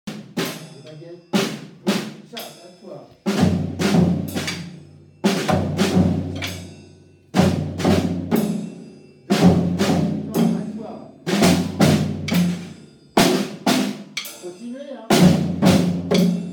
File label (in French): batteurs; orchestre à l'école